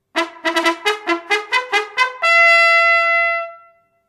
Trompeter Ruf

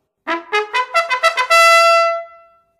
Ruf 3. Eskadron, Kavallerie

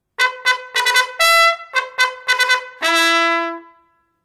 Absitzen, Kavallerie

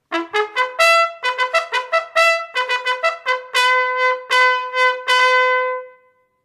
Offizier-Ruf, Kavallerie

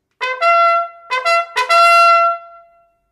Strasse frei

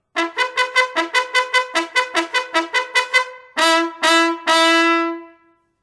Rasch vorwaerts, Kavallerie

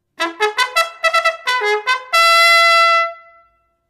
Trab

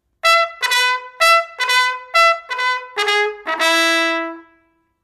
Galopp

